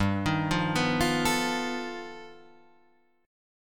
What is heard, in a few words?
G Augmented 9th, Guitar, Standard; G+9 arpeggiated; JGuitar